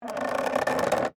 Creak Plastic Stress, Texture, Creak, Plastic, Stress; Creaking Sound Effects; Objects; Blast Wave